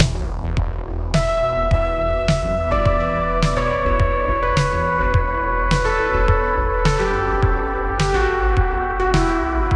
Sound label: electronic synth lead